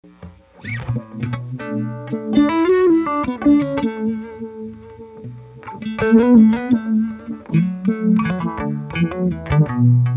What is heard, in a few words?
dirt to fuzz